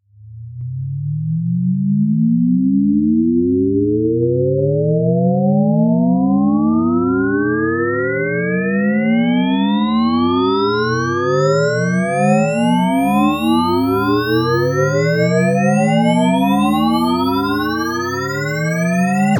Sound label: Sweeps Up, Effects; Vengeance; Essential Clubsounds Vol.4